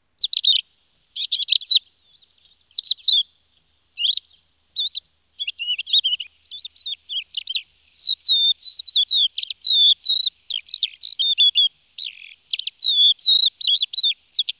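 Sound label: Crested lark; Madarak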